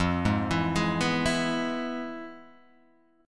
F Minor Major 7th Flat 5th, F, Guitar, Half Step Down